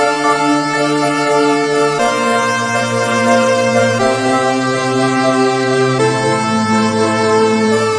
120 lead synth trance with back; SYNTH AND ELECTRONIC LOOPS

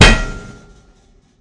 Oven Hit; UNUSUAL KITS and FX; ModeAudio

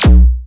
Syn Dr, Overloaded Synthetic Drums; style kits

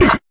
fx drum, Electro FX

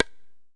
sharp tin, Tabla; Small; ETHNIC and WORLD PERCUSSION

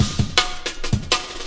162 artcore roller; 161 to 180 bpm

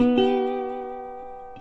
twangy bendy

